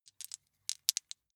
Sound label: small two rattle in hand short, wuerfel